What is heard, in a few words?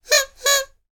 whistle party noise maker; foley; sound effects; sound effects one